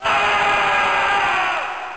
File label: "ah" crowd, Voice; ooh ahh